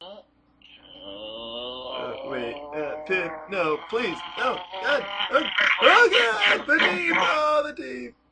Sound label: pip attack